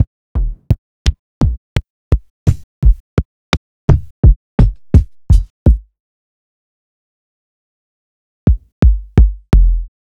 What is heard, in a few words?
sliced kicks